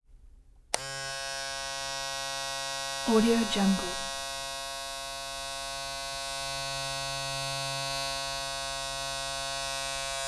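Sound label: electric shaver close up on run constant handle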